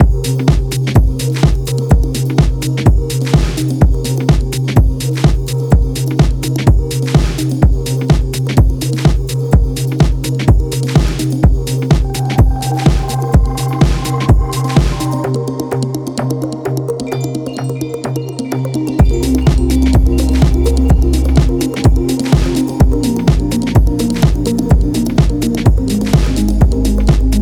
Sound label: palm hand; dream kit zenith; majetone